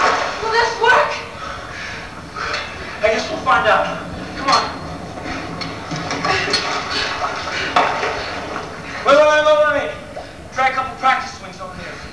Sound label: practice swings